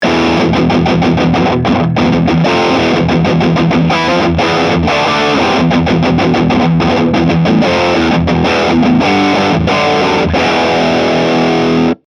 mesa boogie mini rectifier twenty-five channel 2 riff